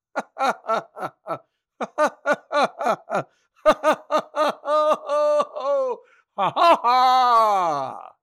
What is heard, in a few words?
Laughter; Foley Library; People Sounds; Judge John Hodgman